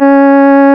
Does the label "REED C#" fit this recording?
Yes